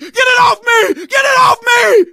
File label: scream while pounced, survivor voice; source engine; left 4 dead 2; manager